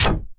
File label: Vinyl Fx; Sonic Foundry (Sony Creative Software); Esoterik Beatz